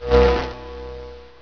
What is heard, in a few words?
armor charge; gearbox